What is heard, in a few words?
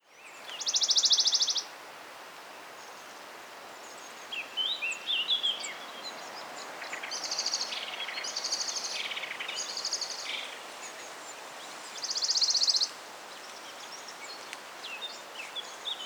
Western Bonellis Warbler Phylloscopus bonelli; chant des oiseaux des jardins